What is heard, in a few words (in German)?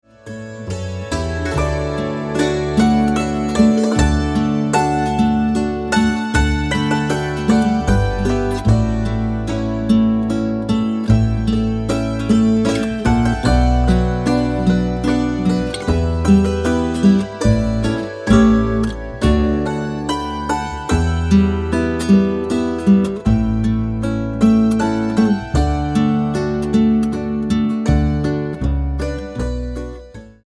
menuett; hackbrettl